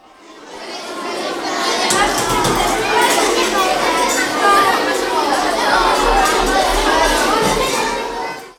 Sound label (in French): sortie; MOBICLIC 13